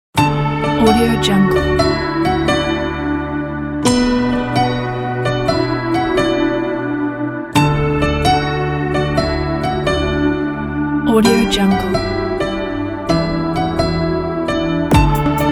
optimistic happy positive beautiful energetic

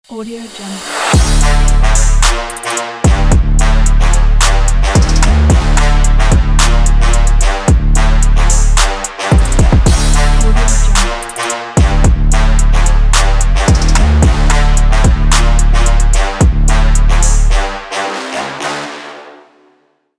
trap logo

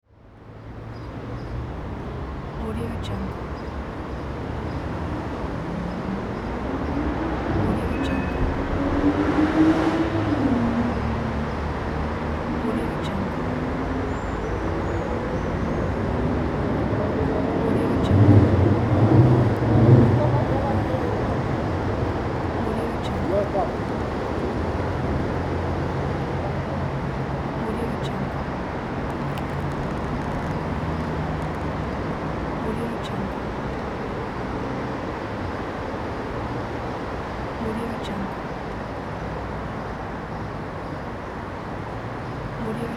city ambience

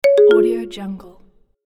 marimba alert notification

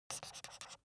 object office marker pen write paper short

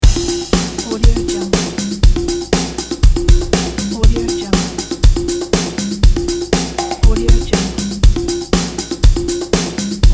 pop conga drum loop

